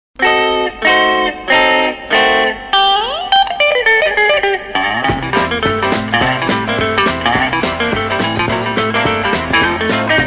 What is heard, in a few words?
guitar boogie